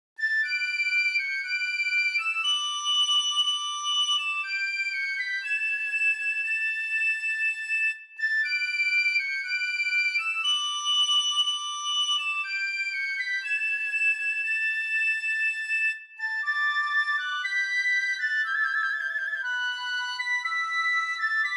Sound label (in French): troisieme marche francoise